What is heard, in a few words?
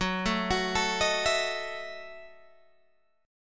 Gb Minor 13th Gb down Guitar Open G